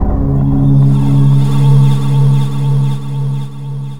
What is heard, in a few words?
SPACE G